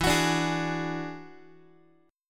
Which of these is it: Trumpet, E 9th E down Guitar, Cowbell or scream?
E 9th E down Guitar